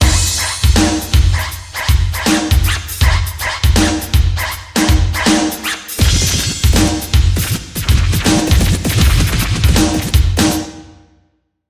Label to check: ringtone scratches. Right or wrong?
Right